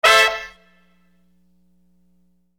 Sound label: Horn Loop, Hip-Hop